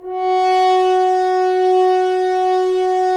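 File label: Solo French Horn, brass; Roland - LA Composer vol. 4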